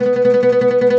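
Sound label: OUD tremolo, Plucked Strings; E-MU Producer Series Vol. 7 – Old World Instruments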